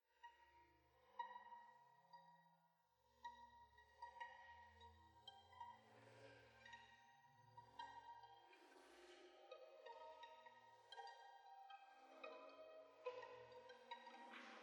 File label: Concerto for Harp, Oboe and Orchestra, Dolente